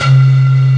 Syn Lead; Best Service Gigapack 1

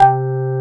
Korg CX3, C 1

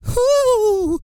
SING, Vocal Planet R&B & Dance; ILIO

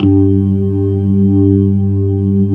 G2, Keyboards Old School; USB Soundscan vol.09; AKAI

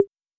Conga Lo, Roland TR-66; Drums

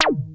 wasp sting; Masterbits; Vintage Voltage; SEQ-FX

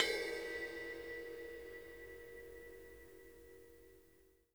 BELL, Drumworks; Sampleheads; New York City Drumworks VOL-1

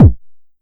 Bass Kick, Jomox Airbase99

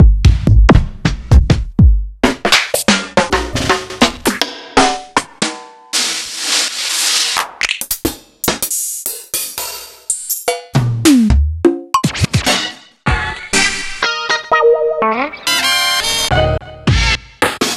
Kit; proteusvx

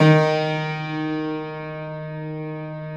Classical Piano; Best Service ProSamples vol.53 - Classical Prepared Pianos; Total Piano; Brilliand Classical Piano; Classical Piano mp +ped

